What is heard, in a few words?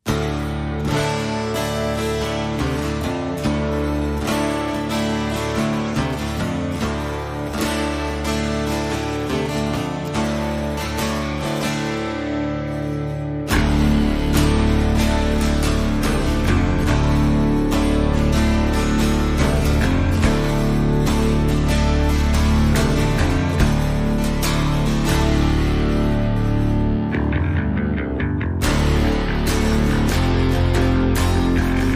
Acoustic Mix